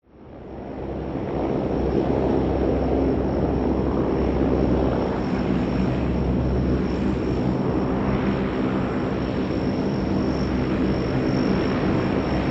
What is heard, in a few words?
C130 Hercules Taxi By